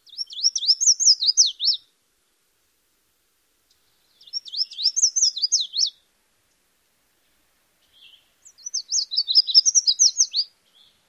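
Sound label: red-faced warbler songs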